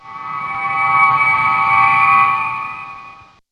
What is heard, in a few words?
STAR TREK BEAM; VOICES